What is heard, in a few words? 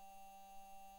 mic, mars2020 edlcam